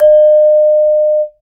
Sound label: note pitched